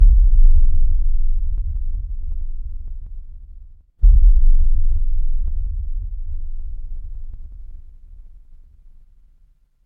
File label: horror heartbeat